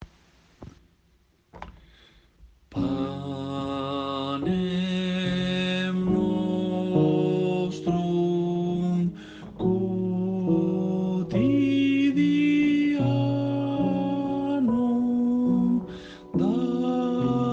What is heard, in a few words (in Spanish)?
Sopranos; Pater Noster